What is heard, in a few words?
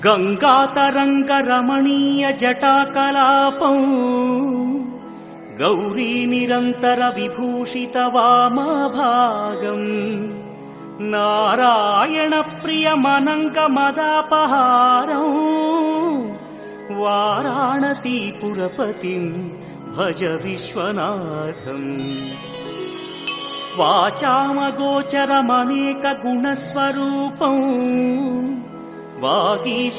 devotional telugu